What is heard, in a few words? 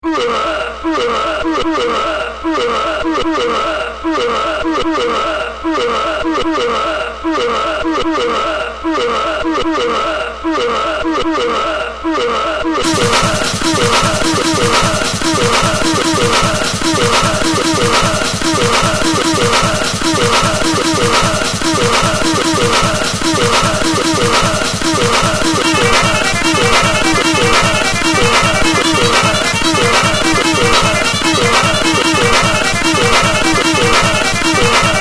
ooh